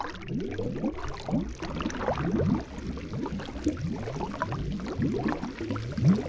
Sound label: Bubble